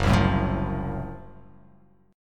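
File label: Gb Minor Major 7th Flat 5th Gb, 8 string; strummed; JGuitar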